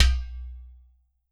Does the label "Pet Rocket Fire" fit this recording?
No